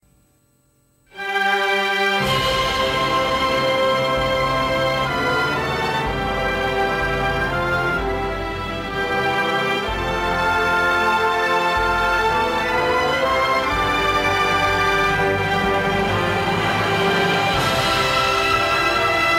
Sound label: anthem